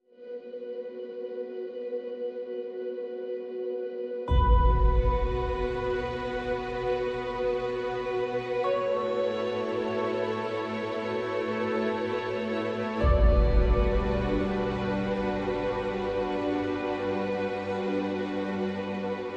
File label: No Drums and SFX